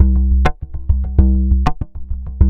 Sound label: DRUMLOOPS, Korg